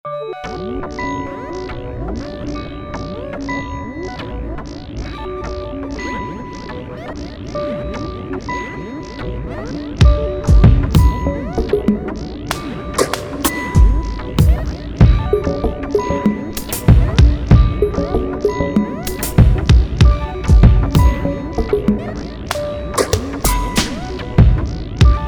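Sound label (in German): alle Vögel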